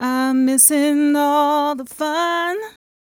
f mel d, fem Vocals

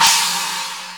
China, Yamaha RX5 drums

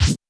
U220 FX Set, Drum Machines; Roland U220

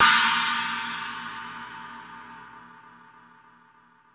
PANG, gongs china; Crypton - DrumBase5000

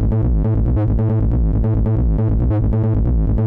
hi energy, techno 138 bpm; WOBBLER

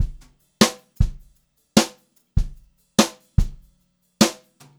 Brushed Drum Loops, JAZZY

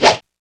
PRC XWHOOS, FM Perc; Rap; Rappin Perc

